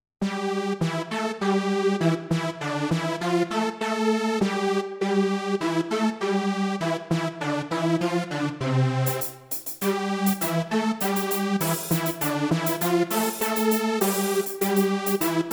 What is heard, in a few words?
Karaoke